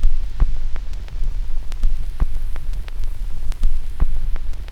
CRACK; E-Lab; X Static Goldmine 2; akai

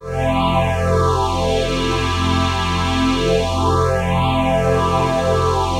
PHASE PAD, ATMO PADS; Best Service; XX Large

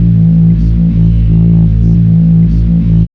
B LOOP; E-Lab; X Static Goldmine 1

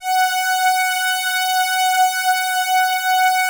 Atmo Pads; Best Service; XX Large

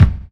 BDRUM; E-Lab; X Static Goldmine 2; akai

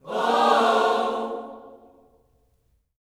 WHOA OHS, Gospel, Vocal; Spectrasonics; Vocal Planet Vol.1 Gospel

